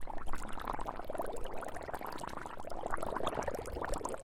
whirlpool; minecraft

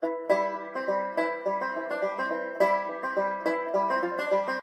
ring banjo; minecraft

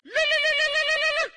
lululul; minecraft

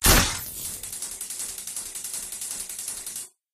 taser; minecraft

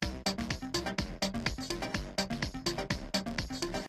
ring arcade; minecraft

